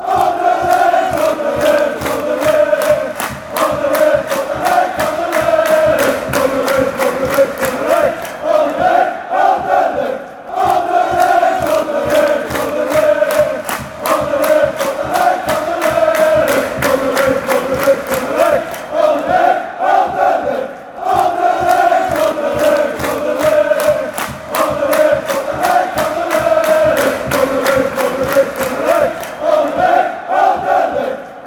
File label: anderlecht anderlecht, chants